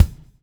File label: BUBBLE; Spectrasonics - Backbeat